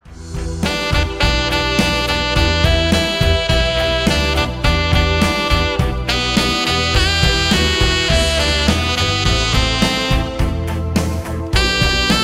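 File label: saxophone cover, jazz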